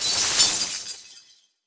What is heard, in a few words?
Ice